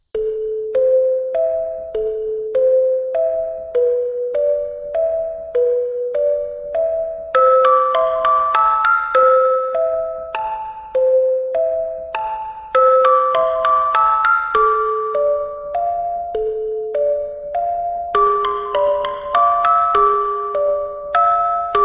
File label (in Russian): Колыбельная музыкальная шкатулка моно; Лабиринт фавна